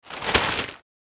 Thin shopping bag

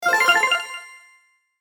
Bright message alert notification tone

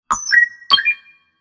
Futuristic tone authorization confirm

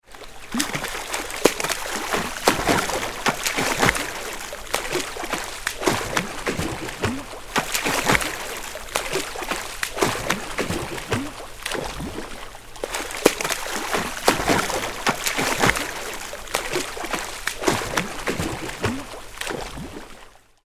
Swimming backstroke indoor pool

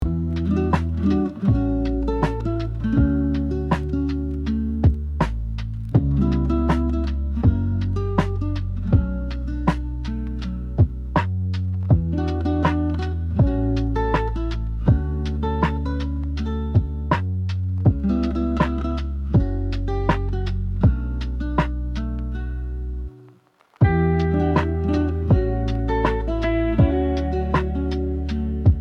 Chill Lo Fi calm cafe reverie

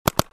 Paintball gun double shot automatic burst; sport

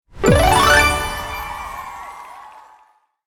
Correct answer game show tv; film and tv; gfxsounds

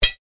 Two swords clash together single strike

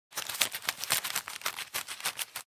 Scissors cutting paper

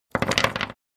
Dice thrown on a casino table rolling

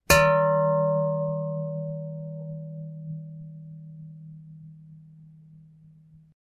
Metal hit hard impact

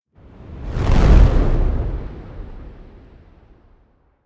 Fire whoosh transition; cinematic

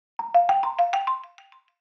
Alert notification delay

